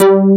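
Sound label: Dance Bass; awe32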